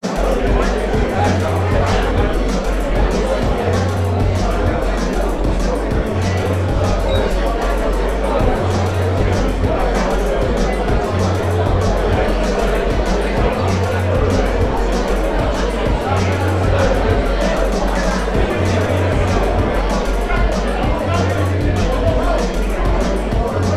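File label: ambience bar large busy drum and bass music; sound effects one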